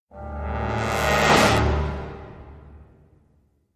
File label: Sting Brass Flare a